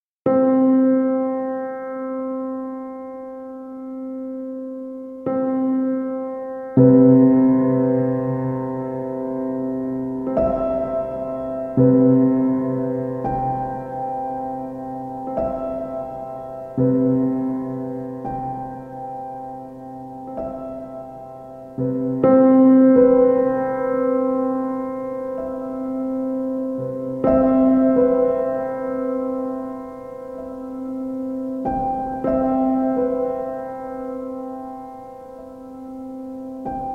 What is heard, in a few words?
Piano Sounds